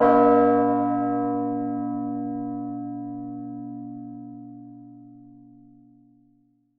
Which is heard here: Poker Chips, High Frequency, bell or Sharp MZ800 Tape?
bell